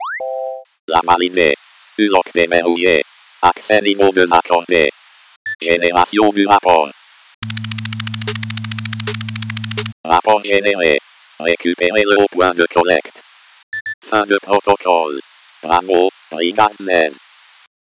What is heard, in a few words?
win, music; le mystere professeur zacus